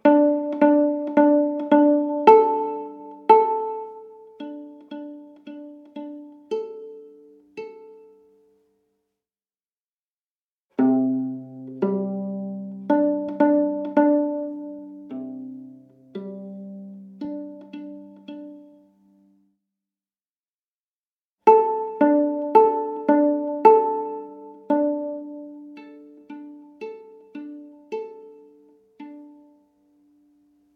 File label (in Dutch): Improvisatie met echos Altviool